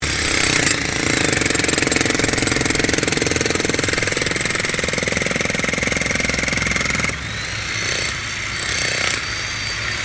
JACKHAMMER BOSCH ELECTRIC DIGGING BITUMEN STEREO, Construction Bosch jackhammer